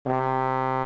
tuba; TamTamMicro.activity

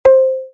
marimba; TamTamMicro.activity

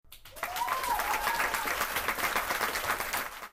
applause; FoodChain.activity

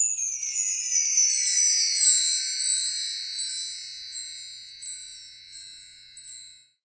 chimes; TamTamMicro.activity

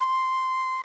flute; TamTamMicro.activity

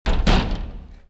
door; TamTamMicro.activity

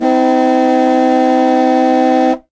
carhorn; TamTamMicro

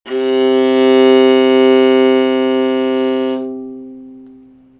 cello; TamTamMicro.activity

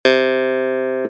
clavinet; TamTamMicro

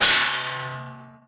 clang; TamTamMicro.activity

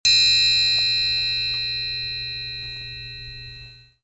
triangle; TamTamMicro.activity

